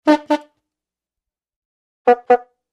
velosipednij zvonok klakson

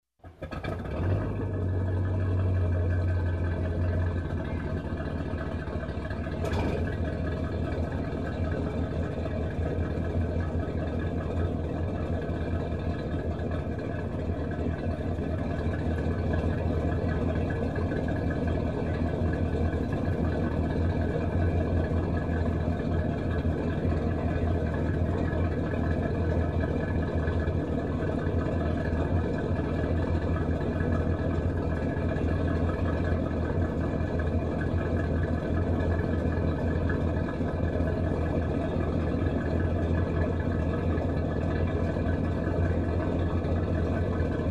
motor dvigatel korablya progrevayut

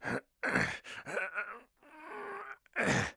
muzhskoj sderzhannij ston

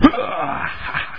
vorchanie

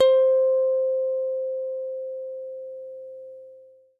gitarnie tona struna d

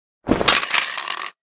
udar po stolu s posudoj

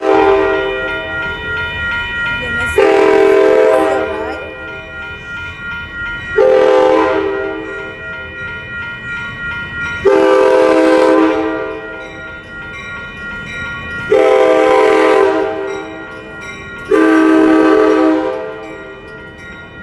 eto poezd kotorij gudit kogda dvizhetsya snaruzhi; amtrak

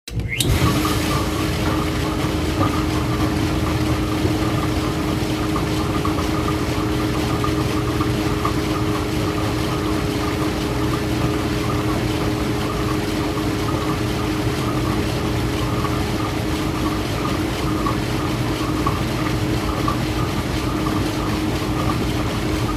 zvuk posudomoechnoj mashini vklyuchaetsya i rabotaet

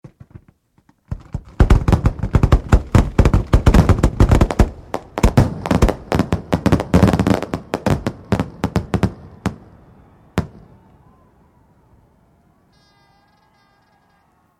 fejerverk

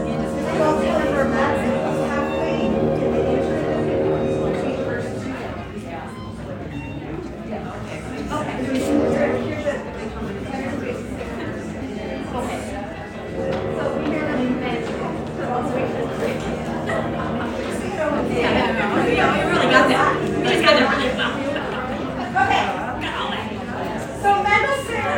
orkestrovij

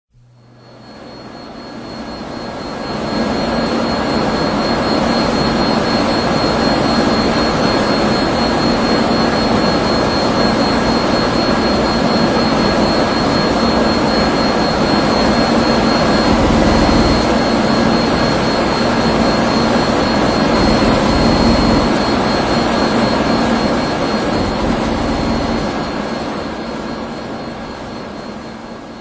promishlennij mehanicheskij shum

